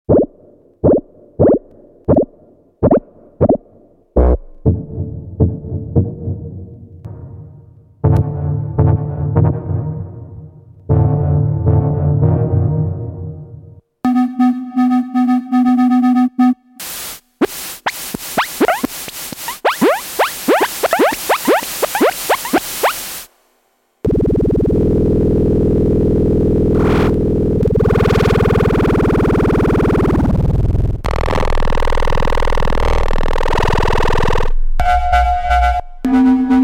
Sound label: synth